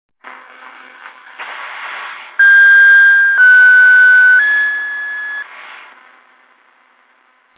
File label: signal 2130 kHz